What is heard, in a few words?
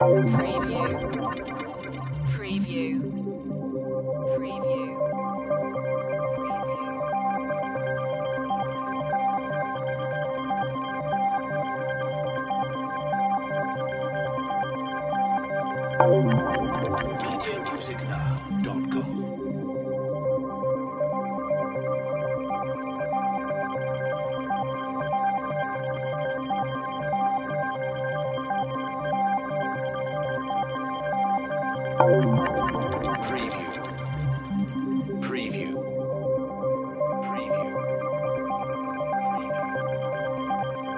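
Production Element Bed Synth; Sound Effects Bible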